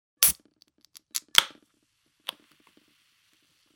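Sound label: beer opening sound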